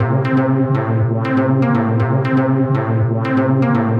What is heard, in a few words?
Progressive Synth, Trance